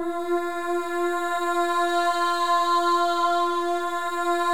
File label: MOVING V2, Symphony of Voices; Spectrasonics